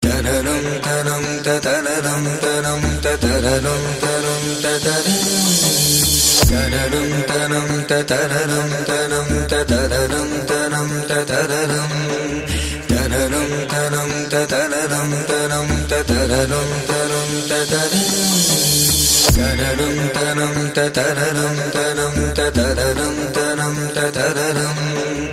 Indian Trap

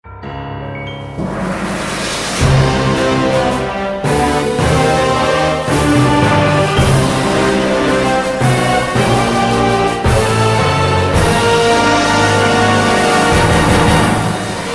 boss music

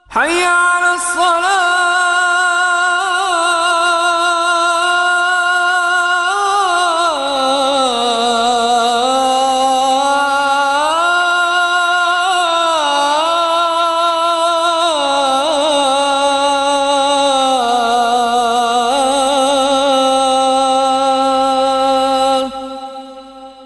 azaan

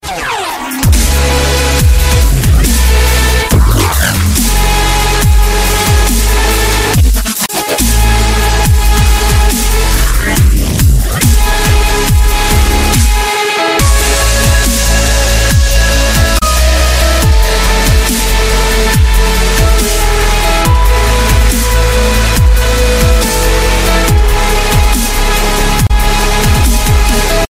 Chillstep

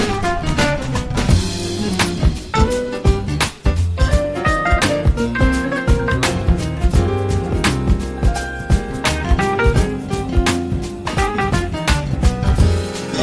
Piano jazz

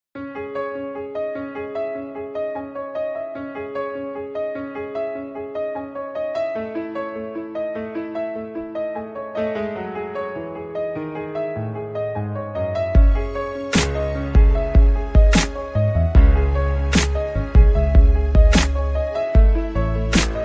piano bass

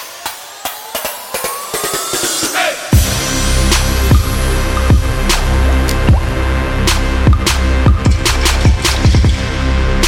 Trap Synthesizer

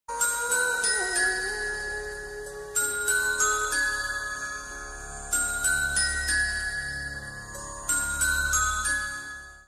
female humming; ringtones

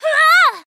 Yell; Ness

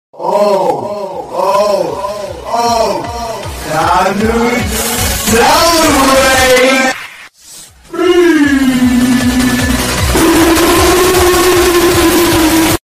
speed BOOOOOOSt

